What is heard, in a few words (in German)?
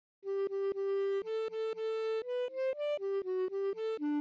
Rhythmus Ubungen; Notenlesen lernen